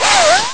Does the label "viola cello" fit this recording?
No